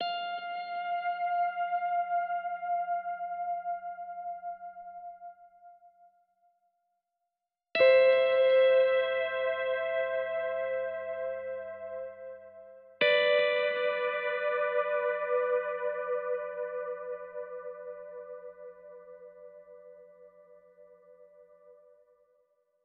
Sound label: guitar; Void Bastards; Krell